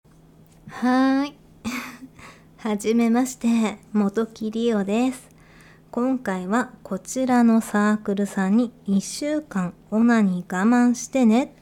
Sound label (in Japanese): 初 ガチ 実演 オナニー; THE FIRST ORGASM